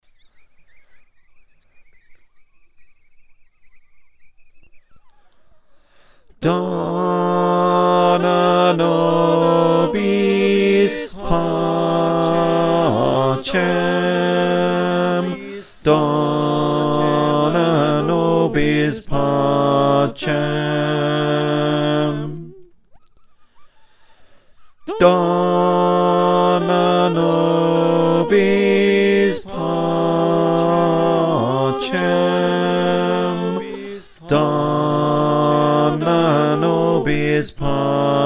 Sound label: Bass, choir